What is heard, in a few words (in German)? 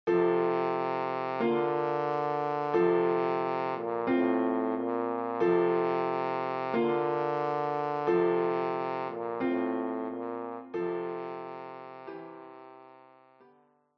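O du froehliche, Horn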